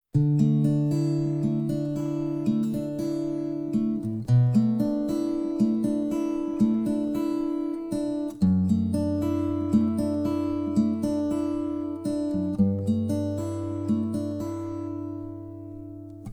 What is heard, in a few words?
Acoustic Version